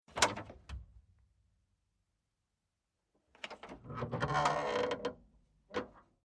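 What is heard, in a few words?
Old Landrover Opening Tailgate Unclipping Metal Hinge Creak; Transport Agriculture; Truck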